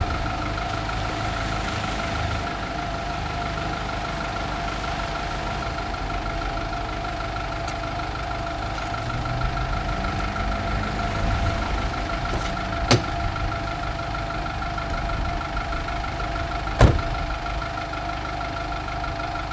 transport cab black london engine idle door open close mild traffic; exterior